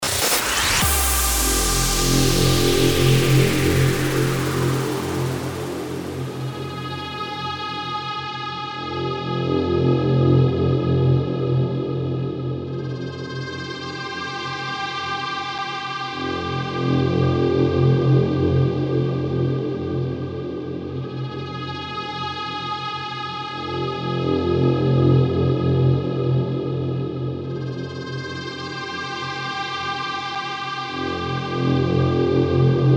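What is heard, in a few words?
drone the throb 130 bpm F minor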